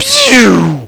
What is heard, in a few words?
Zoom, bad vocals